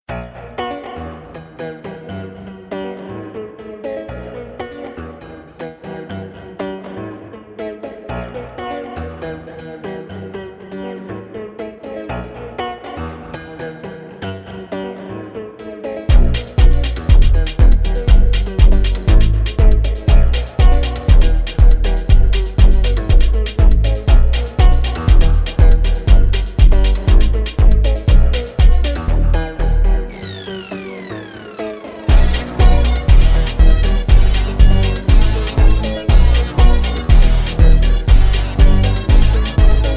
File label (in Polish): 432 Hz, Doskonała, taneczna muzyka pełna energii i melodyjnej; energetic flow; start to dance; Zacznij tańczyć